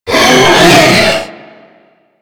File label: attack scream; Warframe; Stalker